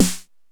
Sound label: Electro Samples 909-S; Central Processing Unit; Electro Samples Vol. 2